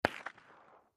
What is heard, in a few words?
9mm Machine Gun